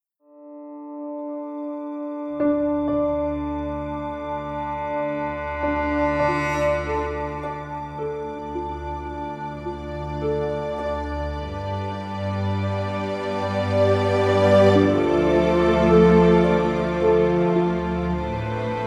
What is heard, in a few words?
Epic soft reflective piano strings